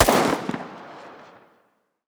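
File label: famas distant, gun; arccw go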